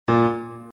a#1, piano

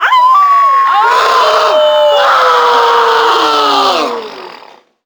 werewolves howling; ff hunted

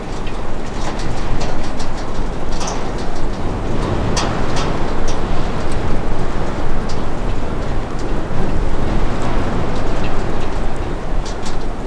wind metal bangs; polarcomplex

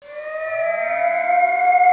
siren, ambience

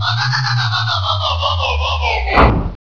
fridge shutdown; ambience; rebar

